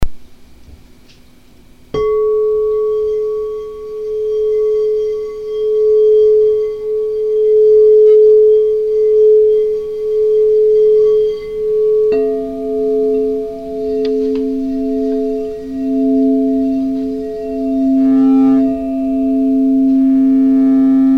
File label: Bowl